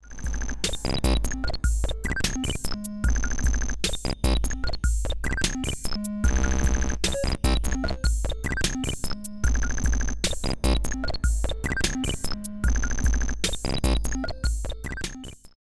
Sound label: Rossum EM Control Forge WT OSC Glitch